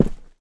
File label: step; GoakeFlax